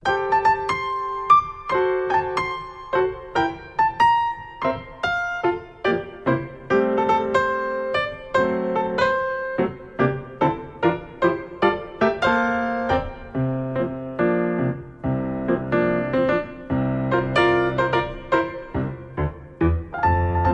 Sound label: Happy Music Ragtime